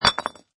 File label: Brick Or Cynder Drop, Small brick, cynder or porcelain ceramic object; Small brick, cynder or porcelain ceramic object being dropped, clattering against surface.; Rock